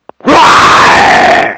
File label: roar arab